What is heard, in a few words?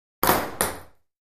Ping Pong Bouncing